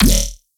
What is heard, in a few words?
Riddim Bass Shot E; Dubstep; Riddim Basslines; Roach; Ghosthack; Advent Calendar 2019 Day 17; Riddim Bass Shots